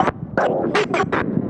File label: SFX LP, 160 BPM, Drum & Bass; Ueberschall; Drum & Bass Flash Trackz